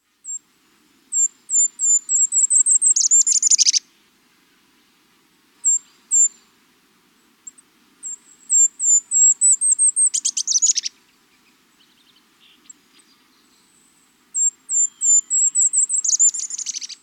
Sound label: Golden-crowned Kinglet